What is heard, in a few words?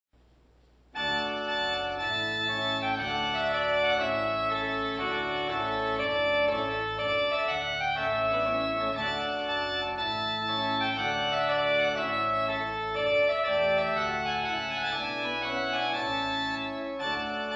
Trumpet Tune; Purcell